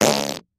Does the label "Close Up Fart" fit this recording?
Yes